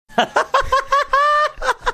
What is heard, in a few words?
cute laugh